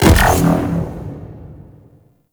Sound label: Heavy Melee Spaceman Shockwave; Warframe; Venus